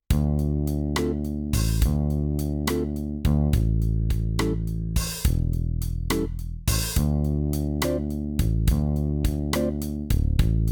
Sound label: tenor sax